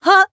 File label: Voice attack; nagi